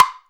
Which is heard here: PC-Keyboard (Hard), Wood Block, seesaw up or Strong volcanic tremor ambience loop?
Wood Block